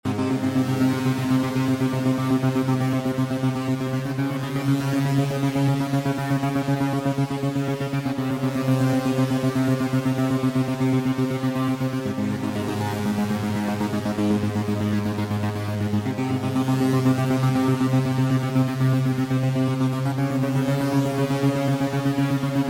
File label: music menu